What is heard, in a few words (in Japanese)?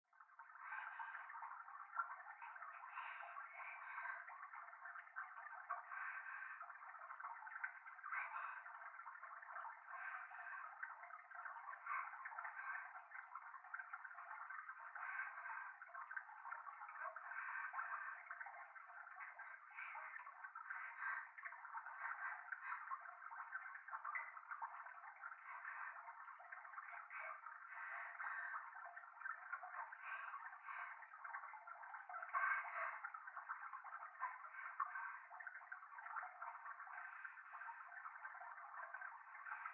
トイレ 左耳 密着 処女 喘ぎ 川のせせらぎ